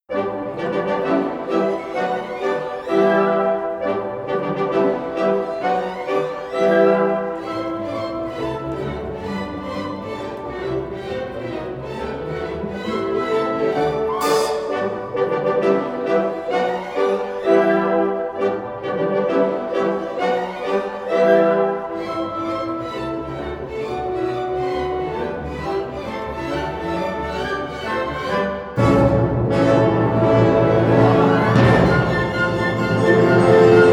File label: Orchestra sample